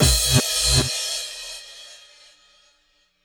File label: Electronic Fx Hit; Sonic Foundry (Sony Creative Software); Electronic Point Blank